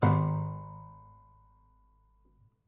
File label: Prepared Piano